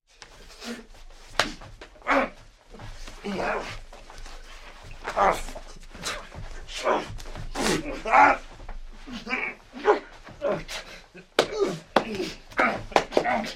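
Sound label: sounds of people fighting and fist punches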